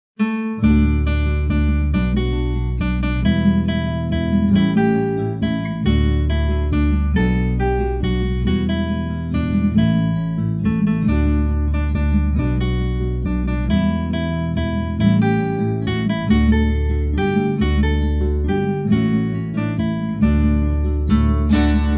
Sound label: instrumental; molly malone; anglais